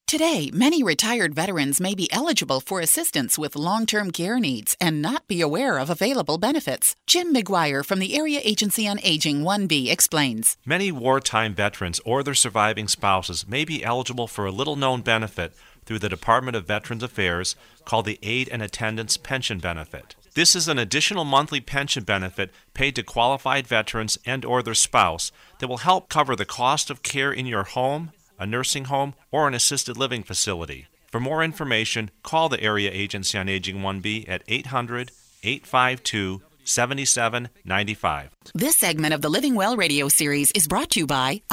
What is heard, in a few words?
Choir; Veterans Aid American House Choir